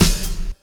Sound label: Circ Snare; Zero-G - Soundclash Nu Dance Electro; Acidized Wavs; Construction Kits; Smooth Circuitry